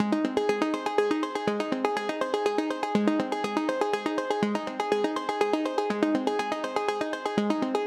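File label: 122 BPM Arps C major A minor Tonic G; arp essentials; Cycles of Motion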